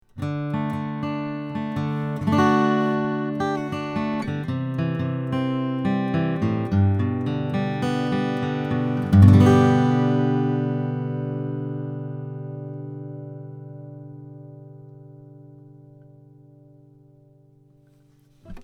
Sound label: Steinberg AXR4 Ac Git Pick 20cm 15th Fret pure